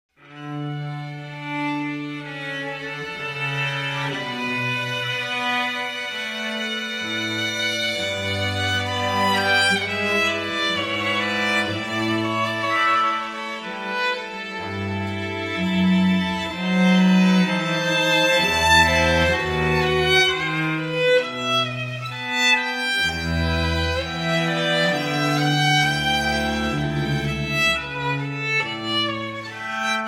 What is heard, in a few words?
strings